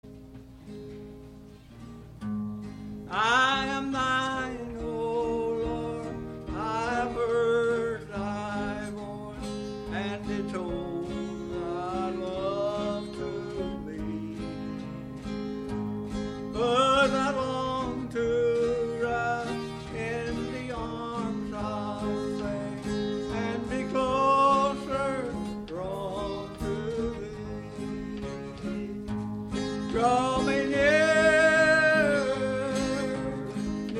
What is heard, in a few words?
Choir; Draw Me Nearer